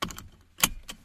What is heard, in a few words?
seatbelt put